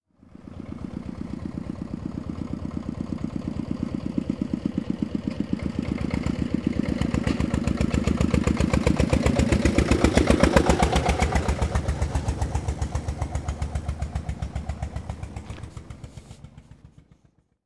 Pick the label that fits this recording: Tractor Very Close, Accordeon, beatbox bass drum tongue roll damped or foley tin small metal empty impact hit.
Tractor Very Close